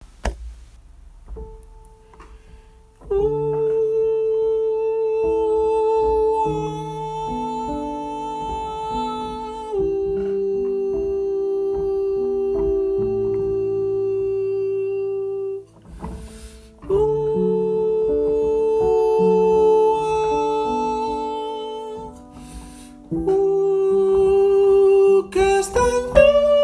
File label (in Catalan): Cançó de mar ALTOS